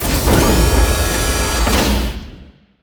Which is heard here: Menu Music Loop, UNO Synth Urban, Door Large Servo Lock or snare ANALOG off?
Door Large Servo Lock